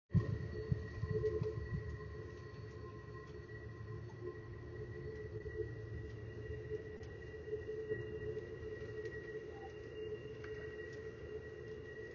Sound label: pennStation